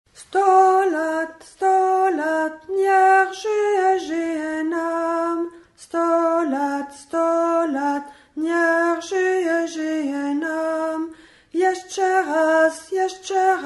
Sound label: Alto; stolat; debut